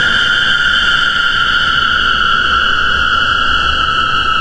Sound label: drift; DanisRace